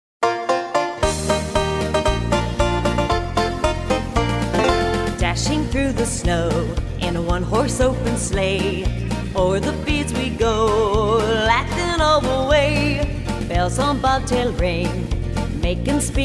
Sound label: Jingle Bells